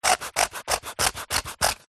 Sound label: foley cardboard small pieces scrape together; zapsplat